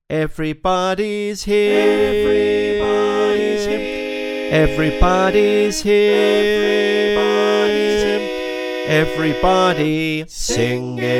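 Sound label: Bass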